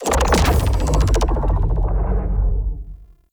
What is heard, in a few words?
Hammer Shockwave Pulse B; Ultimate Abilities; Unairu; Warframe; Focus